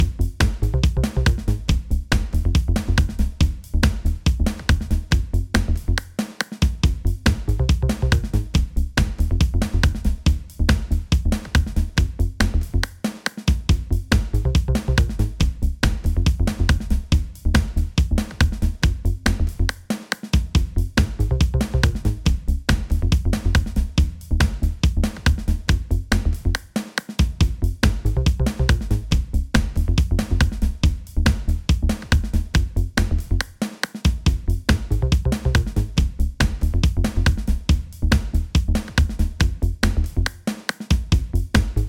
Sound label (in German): Solo mit Snare